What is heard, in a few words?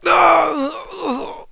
die; AssaultCube